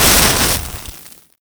candle

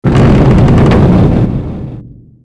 Scary Shuffle; F.A.M.P.S

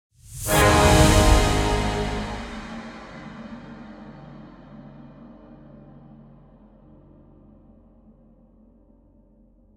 Combat Music Ending Layer 130BPM; ps4; xbox series xs

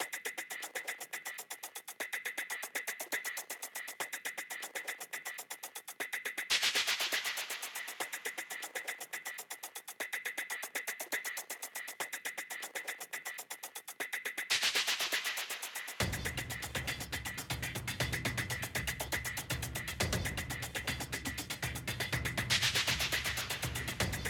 Synth perc Phrase; Worms Rumble; PS4 PS5 Switch Windows Xbox One Xbox Series XS

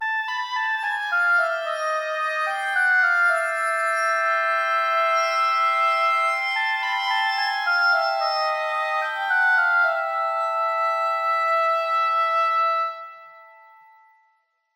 Diabolic Music; Tintin Reporter: Cigars of the Pharaoh

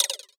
Tip Off Button Hit Click

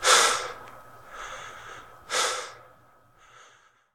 Prisoner Catch Breath

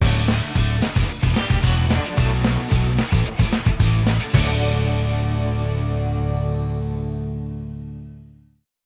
Game Win; My Little Pony Magic Princess; android; Equestria Girls

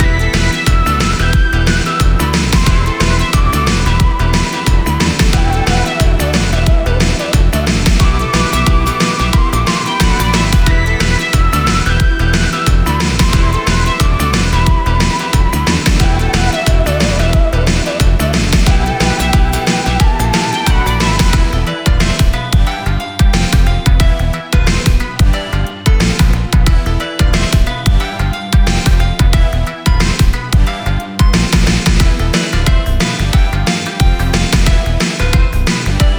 Stage Loop

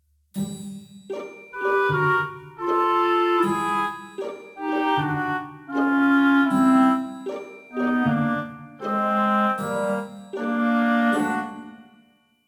jingle church grave; Haunted

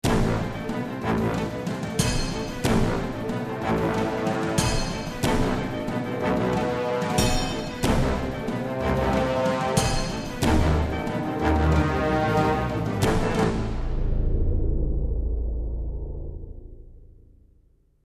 Action

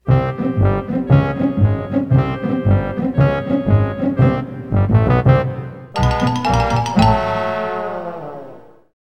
In Game Music; Animaniacs Ten Pin Alley; PS1

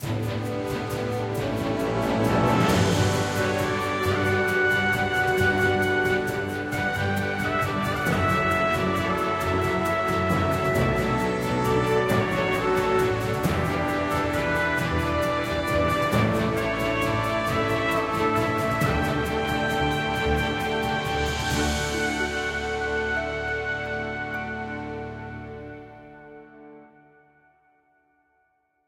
Timeline BGM; AFK Journey; Android

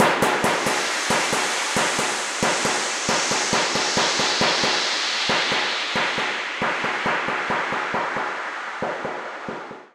Cave Tsheetgroove; Torchlight III; PS4

